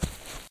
fs grass, singlesteps

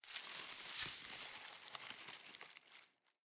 leaves, foraging

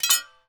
sel metal

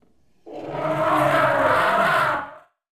elephant attack; fauna

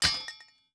mine metal

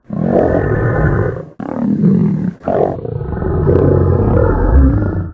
lion attack; fauna

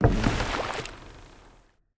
Docks, buildingCompletion; 0AD

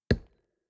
lumber tree

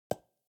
construction wood

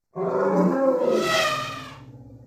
elephant death; fauna

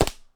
arrow wood, attack impact

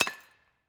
mine stone

